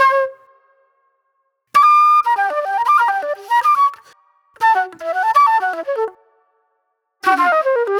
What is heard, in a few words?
Super Soul Flute